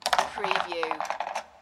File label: Billiard, Pool, Snooker - Ball falls in pocket